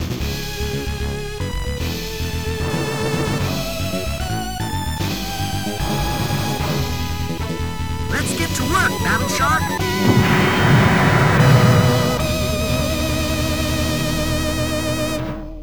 Title Demo (With Voice and SFX); Battle Shark; arcade

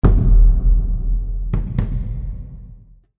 action drums stinger; crysis warhead

